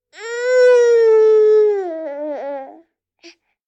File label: baby voice; anemoi